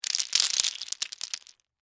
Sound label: Debris Mixed Nuts, Pile; Texture; Debris Sound Effects; Materials